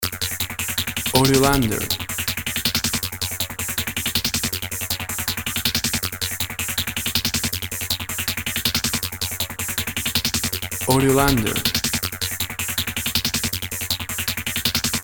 Urban Voragine

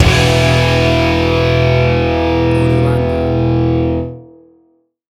Big Epic One Shot Hit; A single short piece of audio used to accent certain parts of your; AUDIOLANDER

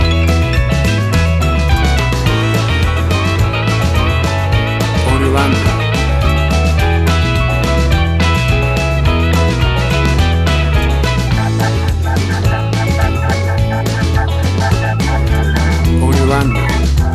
1950s Rock and Roll Country Shuffle; 60 SEC MIX

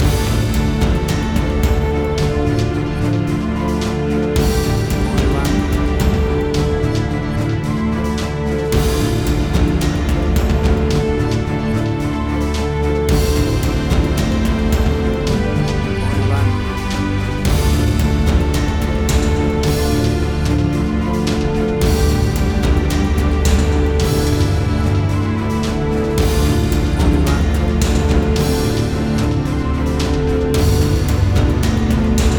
Adventure Action Movie Soundtrack Music

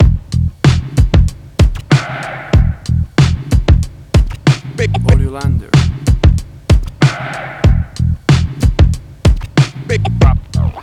90s Hip Hop; Lewis Avenue

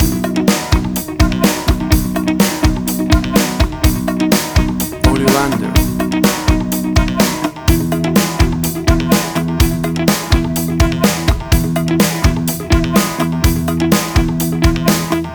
self confident loop

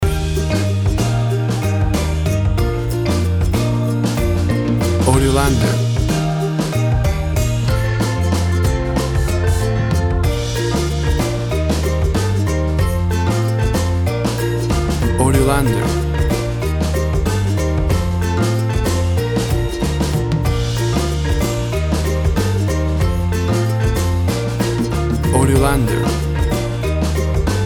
Warm Summer Calypso Sunshine Music

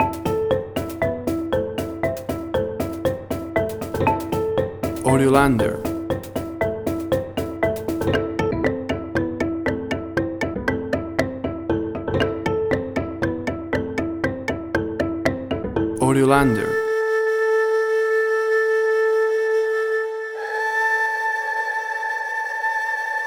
Citizen Luck, Suspense, Drama, Quirky

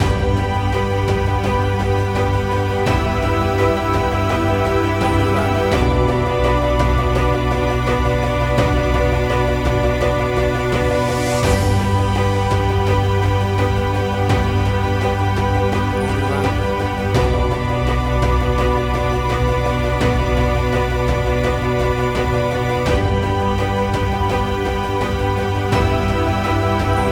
Ascending Emotive Strings Action Track; 30 SEC; AUDIOLANDER

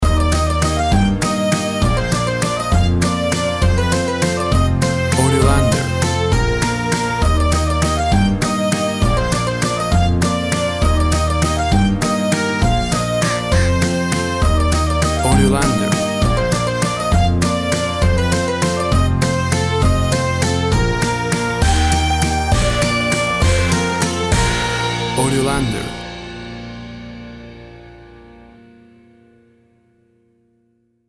Toddler Music; The perfect piece of music for toddlers and babies playing. A cute and bubbly piece of kids musi

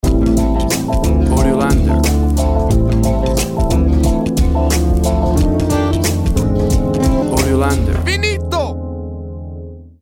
hip jazzey; radio advertising

